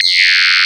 HARDCORE; CMP - Analogon

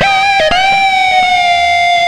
xtra lead (bender); Sonic Foundry (Sony Creative Software); Ugly Remnants VOL-2

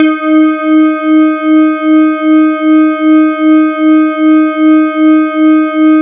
E-HARP, DX HARP; Wizoo - Powered DX

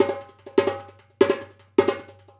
Dumbek; Essential Percussion

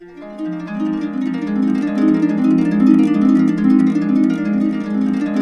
BISBI, Complete Harps; USB Soundscan vol.62; AKAI

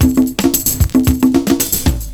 PERCS, 70 s Breakbeats

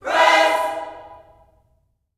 PRAISE, Vocal Gospel

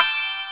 YANG-CH'IN; AKAI S6000 CD-ROM - Volume 1

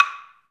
PER Percussion

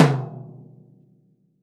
P.MTOM F, VELO TOM

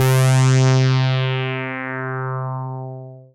Dance Floor Bass, Multisample Basses

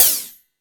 Hip House, drum machine

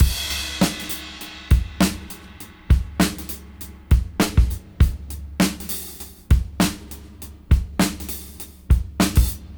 Cym Beat 99 BPM Rev; AMG - Keith LeBlanc Phat Butt Jams

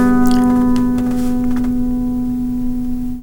GUITAR, Nylon Guitar; E-MU Producer Series Vol. 8 – Platinum Phatt

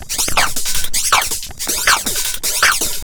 JUNGL 160, JUNGLE LOOP

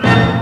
HIT, synths; Rubber Chicken Software Sample Bank

